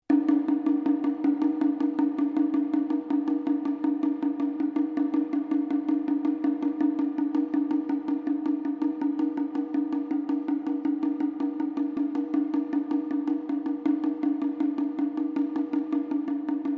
DRUM TAIKO SHIMEB F SEQUENCE, Small Japanese Taiko drum; Miscellaneous Musical